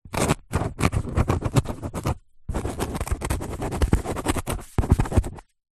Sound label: office pen ball point draw or write cardboard sheet